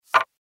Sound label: chip bet; poker game